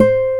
G C4, NYLON; AKAI S-Series CD-ROM Sound Library VOL-1

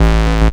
hardcore, BASS, Techno Trance; Techno Trance Essentials

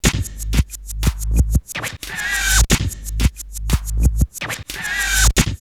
bizzare boyz 90, drum loops, old school; X-Static Goldmine

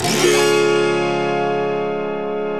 AUTOHARP; Roland - LA Composer vol. 1

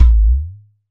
Udo Long, udu, percussion; Roland BK-5

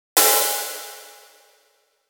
Percussion Hit; Session 05 - Retro Active

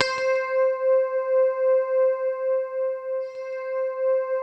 C5, SynthLine

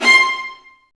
STR, STRINGS; Roland - LA Composer vol. 1

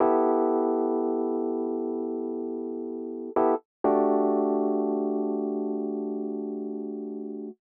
125 Rhodes E, Keys, Nu Jazz House; PowerFX - Nu Jazz House

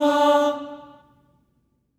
STACCATO D4, Symphony of Voices; Spectrasonics

